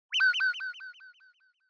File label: Short Accent Low Delay; ショートアクセント; 低 ディレイ